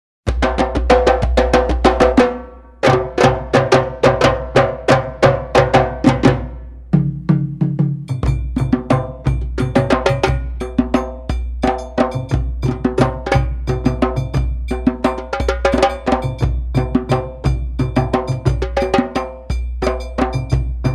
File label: Djemben, Drums; Trad. Mali; Timeline: Bembe; Yebu